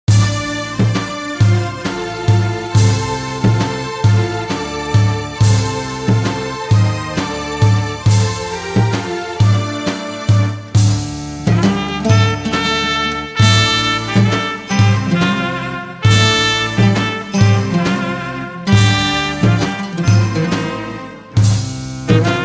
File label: Music Trumpet